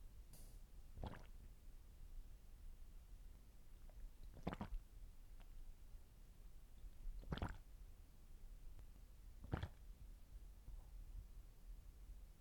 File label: Swallow Throat